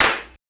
Growl low Dist Clap; percussion

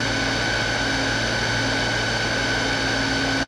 Motor Room

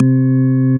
Crunch Organ high

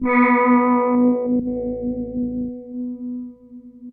Brass

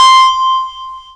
Ring Attack; instrumente

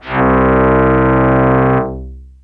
Fiddle long

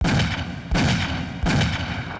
Deep Shot

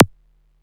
bd soft; percussion

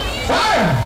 Voc FX

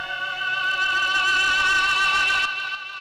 Whip Rise

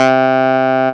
Pulse low; instrumente

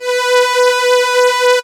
Strings midhigh; instrumente

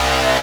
dance chord

